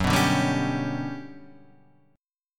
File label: G Major Sharp 11th Gb, chord